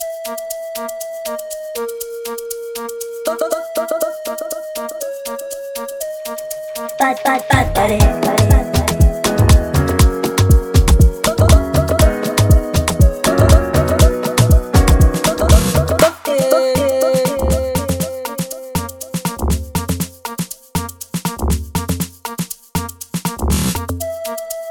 Instrumental Amapiano Version

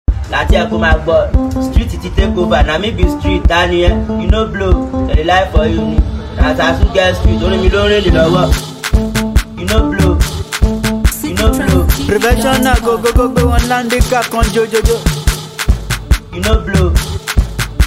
beat

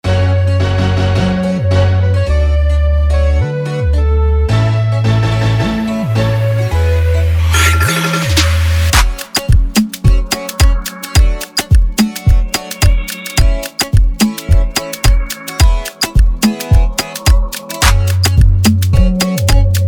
Instrumental

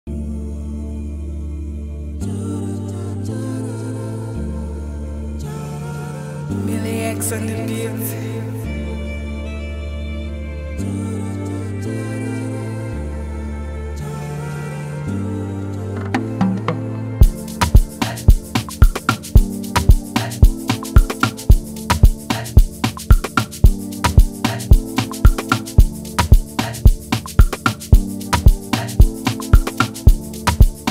Instrumental

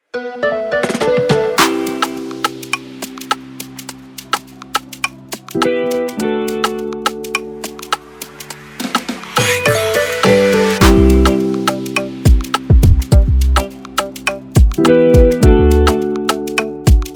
Instrumental

